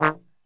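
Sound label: D#2 trombone shot, Brass; Best Service; Brass Super Section; TRMB SHOT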